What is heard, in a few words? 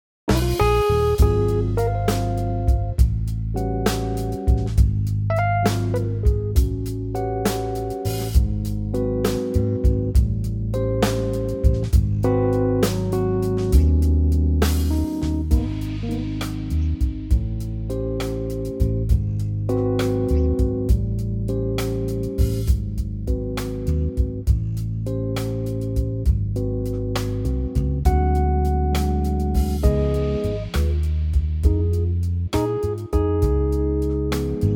Ab smooth